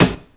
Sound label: raw acoustic snare sample f key; snare drum samples